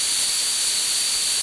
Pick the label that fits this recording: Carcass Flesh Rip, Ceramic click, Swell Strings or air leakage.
air leakage